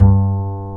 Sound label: BS UPRIT B, Jazz Bass Ac. Fretless; Roland LCDP02 Guitar and Bass